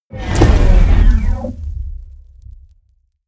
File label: fire whoosh sci-fi medium